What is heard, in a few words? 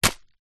food bag of rice dried catch; zapsplat